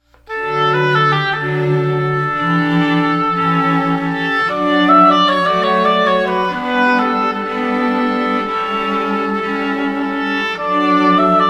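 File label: Classical Trio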